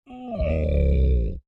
Creature Large Moan Irritated; Monsters; Horror

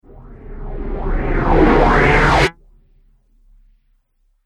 Riser Abrupt Stop Flanger; Rise And Dive Sound Effects

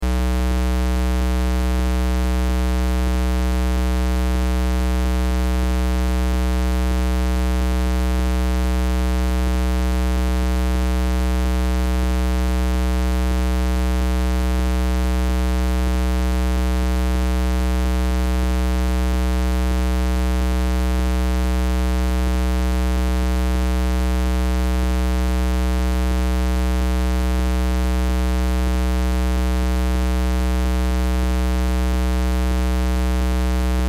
Test Tone Square; Wave 62.5Hz 60 Secs -18Db Loop; Blastwave

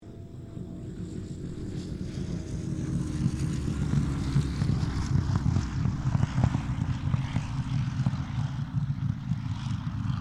AIRCRAFT SUPERMARINE SPITFIRE MK-XVI LAND; Airplanes (Sound Effects:Vehicles)

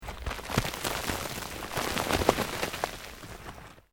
EARTH LOOSE MOVE LONG, Debris

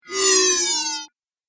Falling Laser Tone; Laser Sound; Sci-Fi Laser Sound Effect

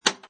Pinball Sound Flipper; Video Game Sound Effects; Video Games (Sound Effects:Games)

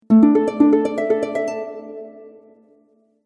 Harp Cascade Up; Musical

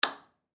Ship Light Switch On; Transportation Watercraft; Boat Sound Effects; Blastwave

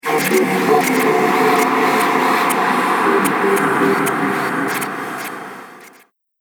Dark Laboratory, scary soundscape; Fantasy Sound Effect; Andrew Fleming

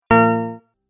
Stop, beep sound effects

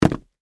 Boots Jump, Concrete; Footsteps; Footstep Sound Effects; Human

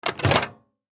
Box Latch Close Latch; Latches; Blastwave; Foley

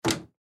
Cupboard Door Glass, Cupboard Door Glass Open; Household; Cabinet Doors

